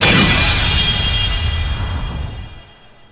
levelup

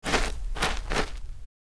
mine bomb

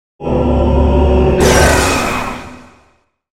Soul Scream effect

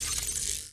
Robot idle

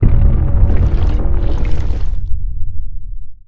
trigger up

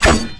dual swing

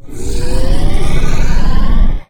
Dark Force effect

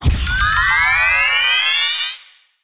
pet death

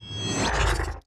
Robot idle

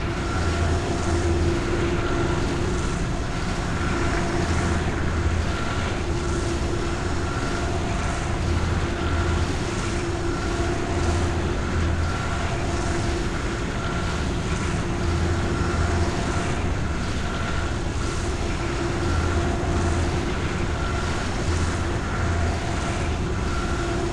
amb Control Room; ambi

